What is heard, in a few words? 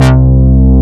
MOOG BRASS; MINIMOOG; Greytsounds VOL-1